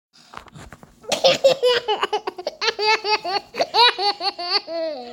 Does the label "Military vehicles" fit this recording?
No